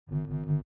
lightsword swing swipes; soundscrate

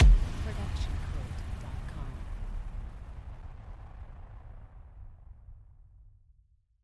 Cinematic Hit

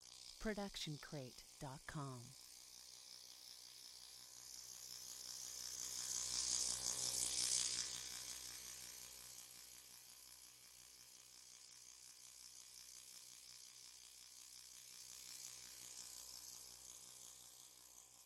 hovering drone; soundscrate

